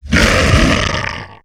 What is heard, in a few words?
Super Snarl, Vocals - Monster; Sony Pictures Sound Effects Series